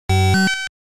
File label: Ice Block Destroyed; Pengo; arcade